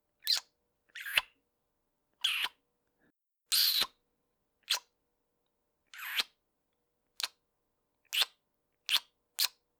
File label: Kiss Polite